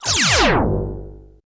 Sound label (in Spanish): Disparo Láser Espacial